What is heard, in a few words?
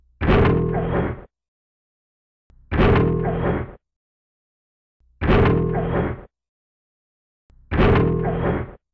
Heartbeat